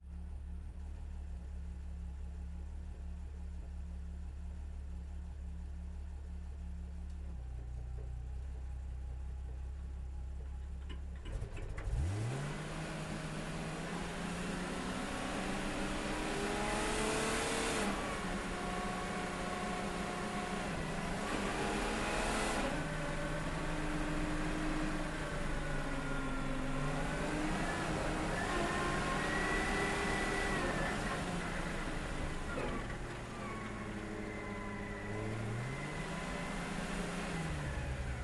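onboard slow drive stop reverse stop drive stop engine intake; dpa4062; Sonniss GDC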